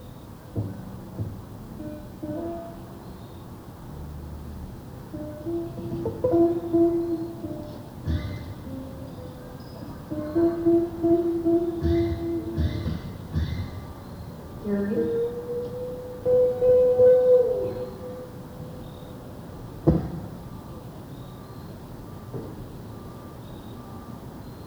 soundcheck

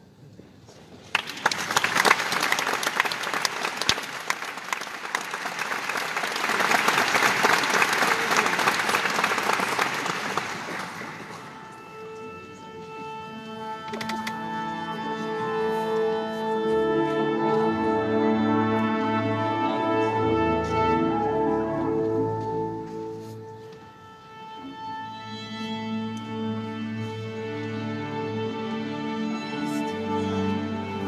tuning; the meyerson symphony center - dallas, texas

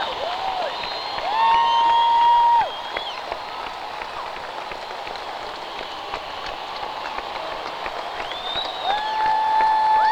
encore break

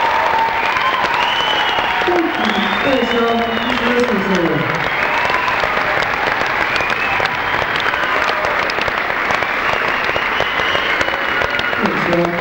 crowd; canberra theatre; canberra, australia

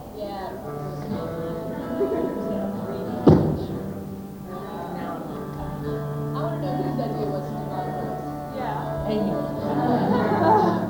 soundcheck

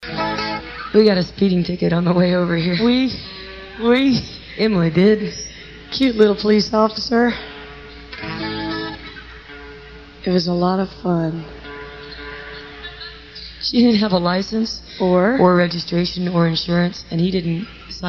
crowd talk; speeding ticket 1

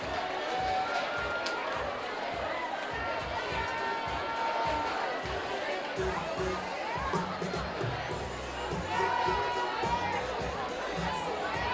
post show crowd noise; house of blues - houston, texas

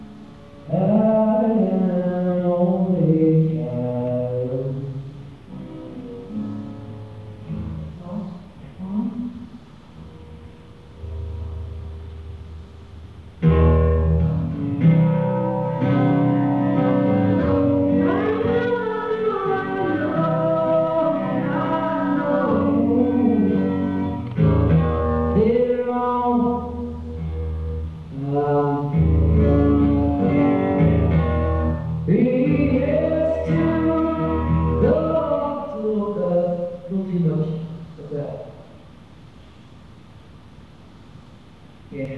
three hits soundcheck

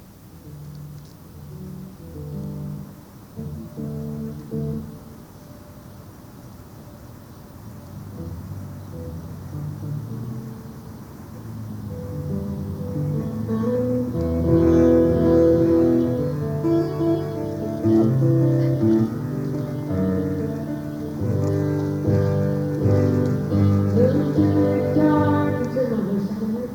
soundcheck

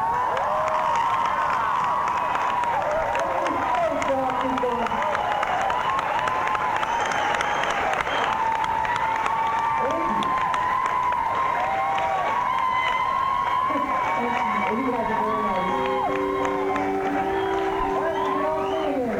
crowd; adelaide, australia

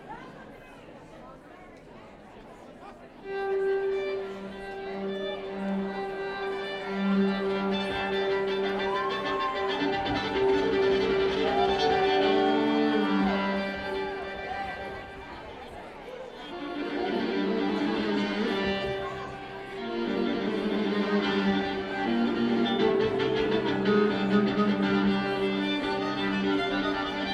violin intro

